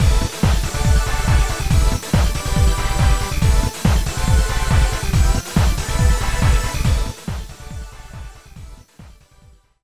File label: music 1bit dpcm; 4bit/2bit/1bit